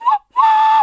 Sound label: WildFX, African; Quazibeat; African Secret; SFX